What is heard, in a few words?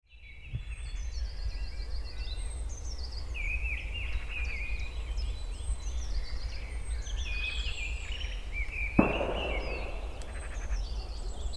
Garden, field recordings